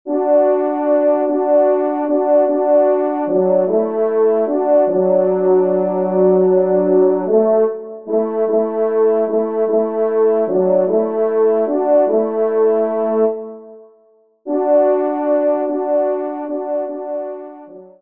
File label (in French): TRP; Plaintes du Cerf